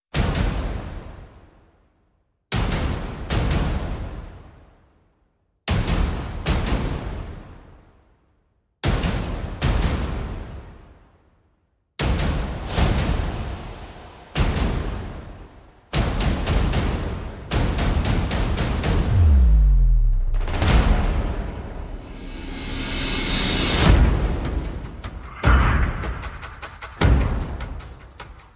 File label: Perc and FX